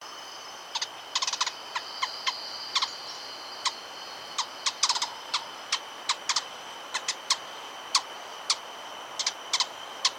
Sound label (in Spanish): Colibri Amazilia Rabirrufa